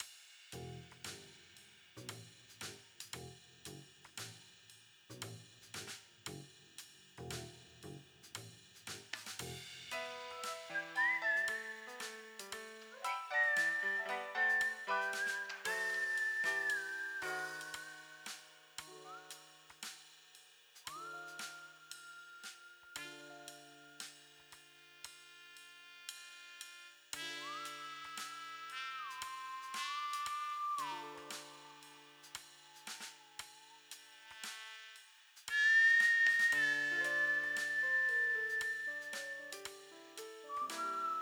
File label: menu theme